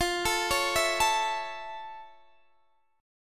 F Augmented Major 7th, Guitar, Half Step Down

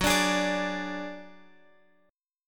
Gb Suspended 2nd Sharp 5th Guitar Open F; strummed

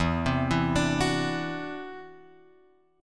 E 7th Suspended 2nd Sharp 5th, chord; E7sus2#5 Chord {0 3 2 X 3 2} arpeggiated

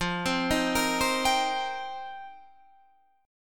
F 7th Suspended 2nd Suspended 4th; F7sus2sus4; arpeggiated; 13,15,13,15,13,15

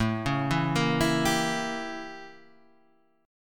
A 7th Flat 9th; A7b9 Chord {5 4 2 3 5 3} arpeggiated

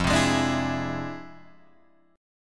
F Minor Major 13th, Guitar, DADGAD

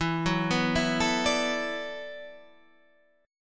E 7th Suspended 2nd Suspended 4th, down; E7sus2sus4; arpeggiated

